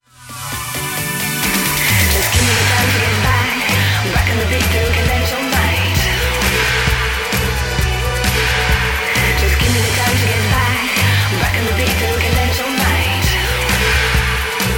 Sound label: Backbeats; Nokia Design Team